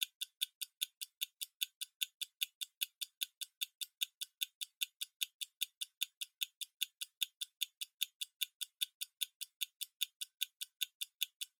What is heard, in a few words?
Stopwatch Mechanical Tick Hand